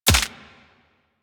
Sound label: Bullet Impact Head Hit Notification; Warframe; Weapons